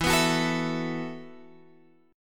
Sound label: E Suspended 2nd Suspended 4th E, Guitar, Open F; Esus2sus4; strummed; x,11,11,13,12,11